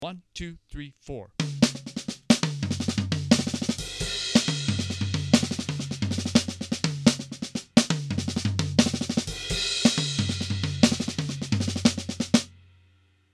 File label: Melody Kit